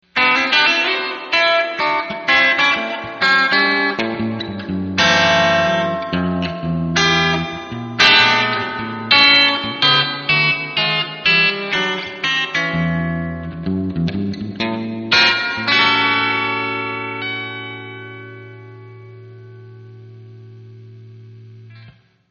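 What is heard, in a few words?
spring reverb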